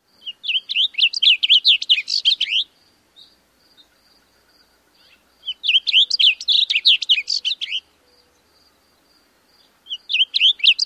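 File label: song, Blue Grosbeak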